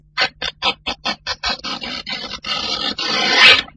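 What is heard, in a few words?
tearing a big piece of fabric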